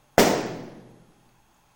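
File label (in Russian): глухой звук удара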